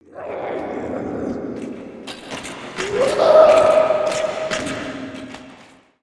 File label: Cave Ambience; Skullken; ninjago